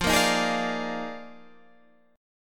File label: Eb Major 7th Suspended 2nd Suspended 4th F